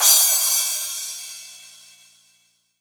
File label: Crash, Cymbals